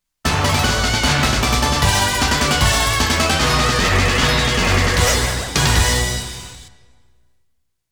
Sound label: STAGE CLEAR; Konami Game Music Collection; Stage Clear BGM